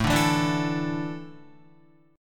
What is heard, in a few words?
A Suspended 4th down Guitar; Asus4 strummed